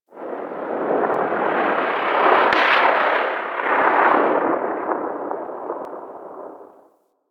Gas City Ext Distant Air Rip; Ambience; Warframe; Corpus Gas City

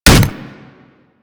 Claw Hit; Manic; Attack

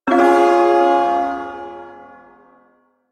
Ersatz Shawzin Phrygian Chord; F; Warframe; Duviri Ersatz Shawzin

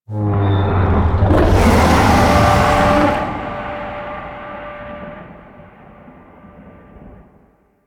Void Dragon Vocal Distant Idle; Warframe; Duviri

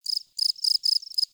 Forest Open Glade Cricket Close, Day

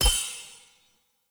Enemy Weapon Magic Block Layer; Weapon Impacts

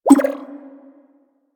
Blessing Thank You Bubble, UI; Warframe; Blessing Device Menu

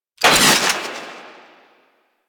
Flak Cannon Movement; Grineer

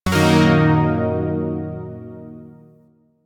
Shawzin Blues Chord G; Music; Warframe; Zariman Shawzin

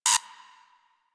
Uzi Pistol Mech Clip, weapons; Grn Uzi Pistol

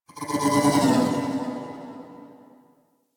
Sentient Lair Exterior Creature; Misc Creatures; Warframe; Ambience

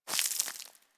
Settlement Sand Fine Debris; Ambience; Warframe; Grineer Settlement

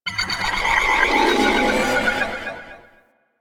Ship Distant Energy Pulse; Warframe; Corpus Ship Remaster

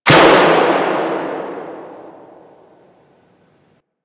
Ocean Light Clunk Small; Ambience; Warframe; Grineer Ocean

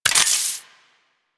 Modular Primary Handle Reload, Guns; SU Modular Guns; Reloads

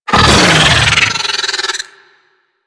Pet Vocal Angry; Enemies; Vocals; Warframe; Alad V Pet

